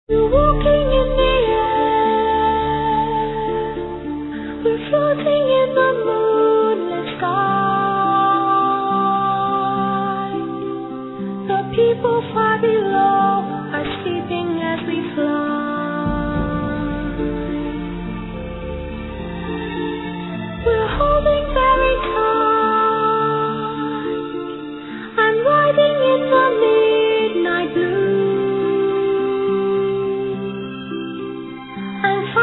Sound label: Celtic women